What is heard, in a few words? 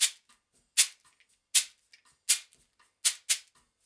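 shaker, Percussion Loops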